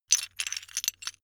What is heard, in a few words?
metal pieces clatter short